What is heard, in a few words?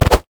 tiger attack; LoL; udyr